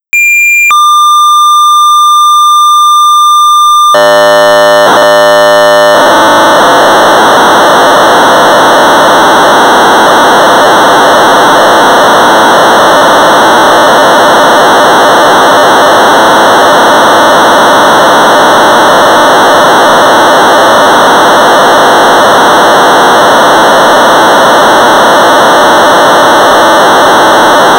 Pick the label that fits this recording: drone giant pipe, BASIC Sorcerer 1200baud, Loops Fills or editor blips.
BASIC Sorcerer 1200baud